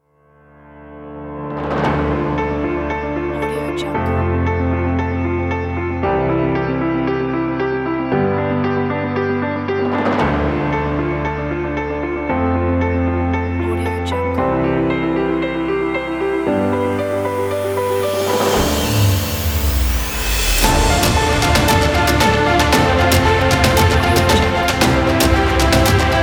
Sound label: epic motivational cinematic